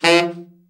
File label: BRA TENOR SN, SAX